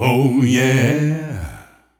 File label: oh yeah SUNG